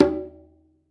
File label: Conga High Open; SPD One Sounds